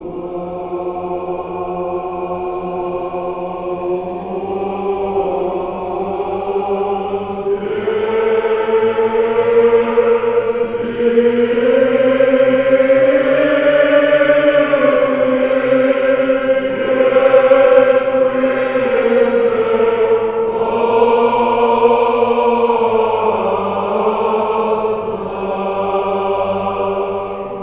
Рожеству Христову. Рогожский хор

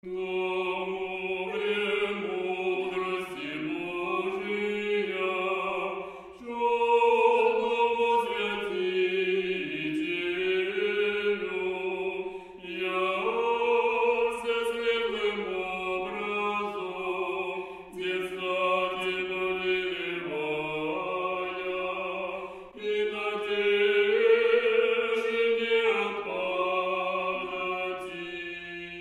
Стихера Знамению. 2 глас. Знаменное; Подобен Доме Евфрафов; Дому премудрости

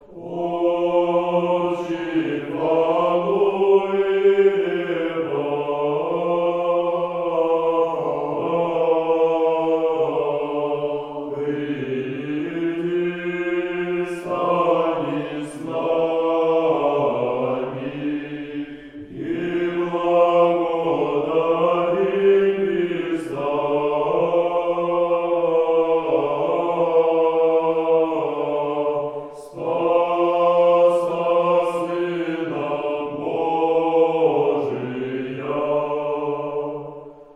Сретение. 5 припев 9 песни; О Дщи Фануилева; Казань

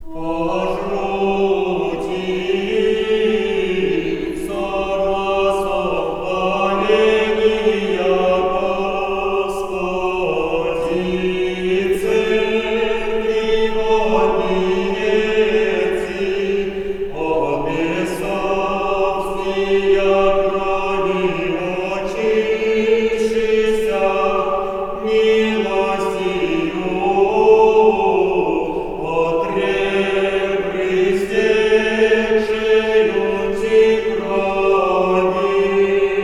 Ирмос 4 песни 4 глас

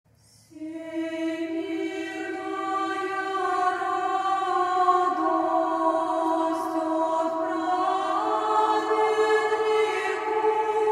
Всемирная радость. Хор Московского Духовного училища Москва. Женский хор

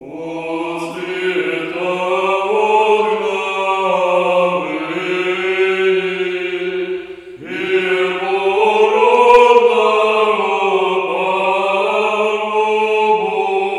Преображение. Ирмос 5 песни; От света отгнавыи

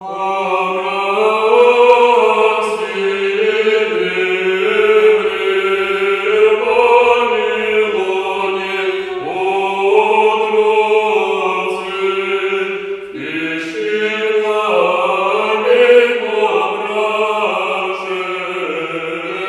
Преображение. Ирмос 7 песни; Авраамстии древле

